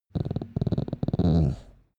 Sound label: dog small growl very soft